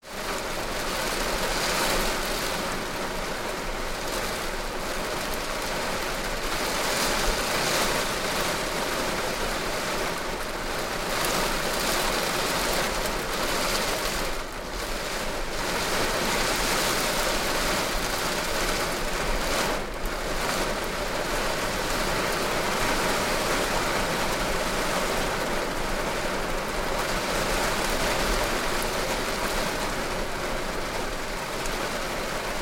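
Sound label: pleasant rain sounds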